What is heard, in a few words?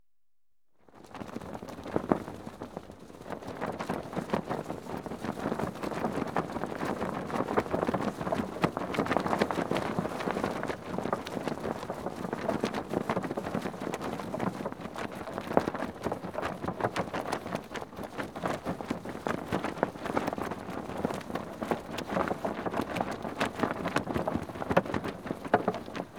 Sailing Dinghy, Sail Flapping; Ships And Boats; BBC Sound Effects Library; Ships And Boats 2